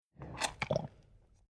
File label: Animal Eating Beef Bull Heavy Chewing And Burping Monster; Tom Hutchings